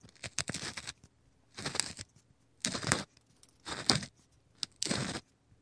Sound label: Grinding Pepper Mill Loop